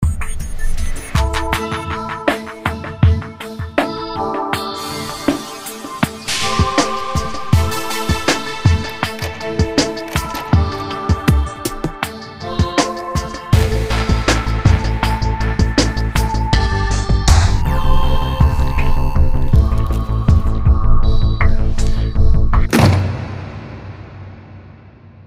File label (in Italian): atmosfera